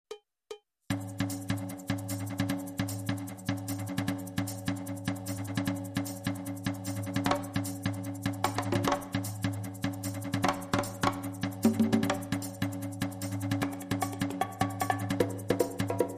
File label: Conga Bongo Loop, Split Mix